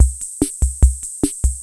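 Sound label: ROCK, drum machine; Crypton - DrumBase5000; TR-66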